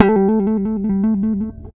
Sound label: REAL BASS, BASSLICKS; Ueberschall; Jam Box